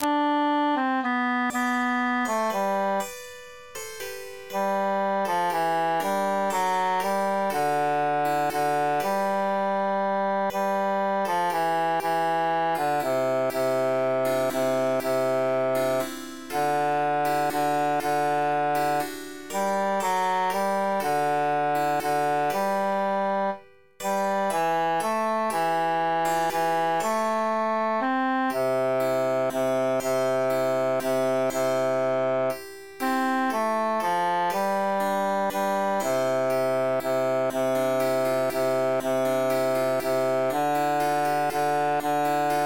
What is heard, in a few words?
tenor emphasized; follow me sweet love